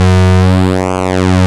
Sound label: PULSE MOD; Vintage Keys; E-MU Formula 4000 Series Vol. 3; Analog Odyssey